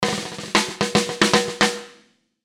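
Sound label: Musical Element, Drum Snare March; Drum Kits; Blastwave; Sound Effects:Musical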